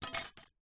set squares plastic set down on wood

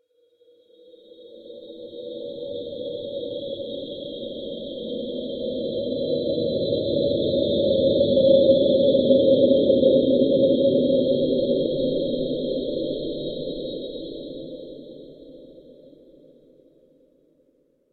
sound design ghostly drone deep dark and eerie

